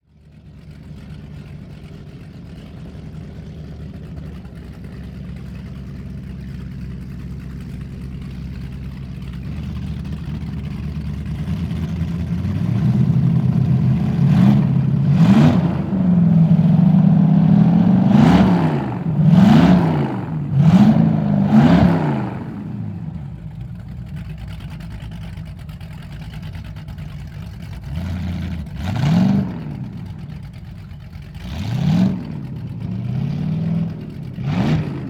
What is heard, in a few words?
Transportation Boat Power Boat Idling And Revving